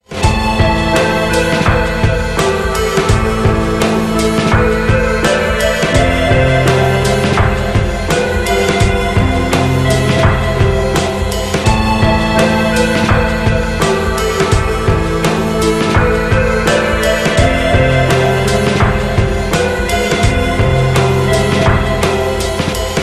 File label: Nightmarish Waltz